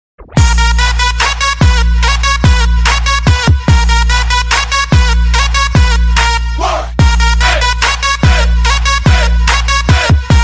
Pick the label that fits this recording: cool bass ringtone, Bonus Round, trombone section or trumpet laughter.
cool bass ringtone